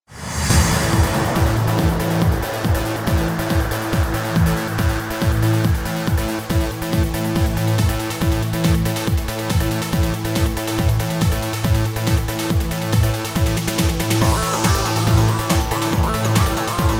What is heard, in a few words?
dungeon; sinjuku; Shin Megami Tensei Imagine gamerip